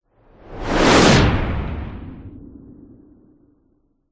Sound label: sound design cinematic whoosh massive heavy fast close; zapsplat